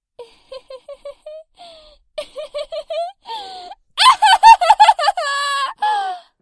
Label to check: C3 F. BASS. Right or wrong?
Wrong